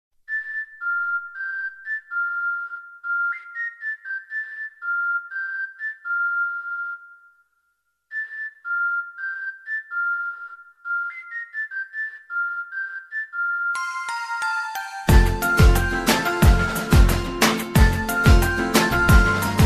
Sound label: whistle music